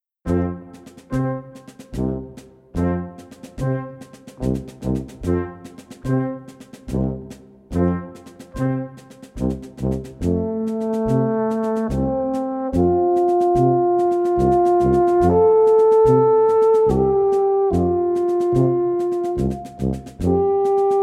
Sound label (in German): Alphorn Bolero